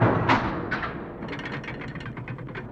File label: Ambience - Control Room; SpongeBob SquarePants: Employee of the Month; Chapter 2